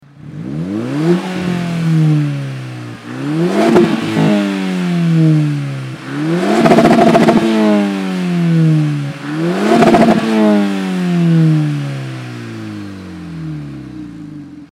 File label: Stand, catback system golf vi gti abgasanlage; APR